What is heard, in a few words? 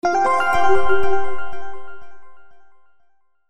ALERT